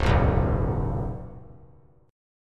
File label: Db 7th Suspended 2nd Suspended 4th; JGuitar